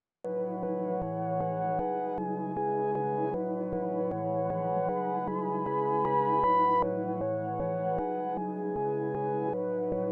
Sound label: 155 BPM C# Min Keys Reverse; Melody; Loop Stems; Cymatics; Infinity Melody Collection (Preview)